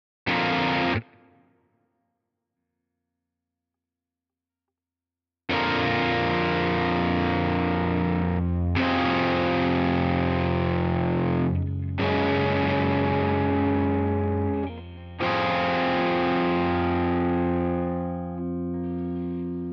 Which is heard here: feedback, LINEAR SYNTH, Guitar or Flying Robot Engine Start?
Guitar